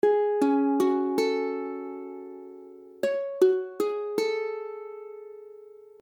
Fismadd9, akordy ukulele; F#m(add9)

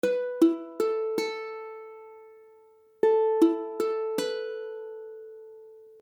Esus4, akordy ukulele

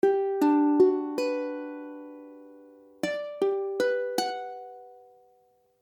Gmaj7, akordy ukulele

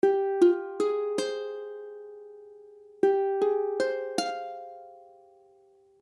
Fmadd9, akordy ukulele

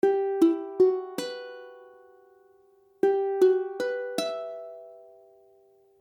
Emadd9, ukulele; ukulele akordy

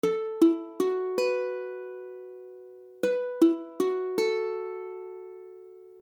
Fism, akordy ukulele; F#m

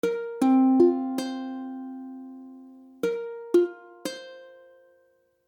Bbsus2, akordy ukulele

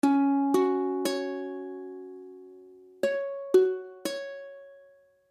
Fis5, akordy ukulele; F#5

